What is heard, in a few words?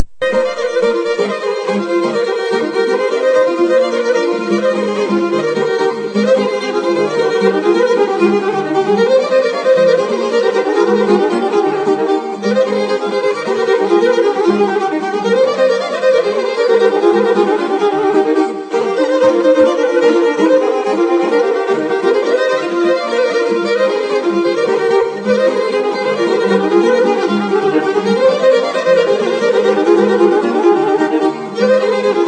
Gadulka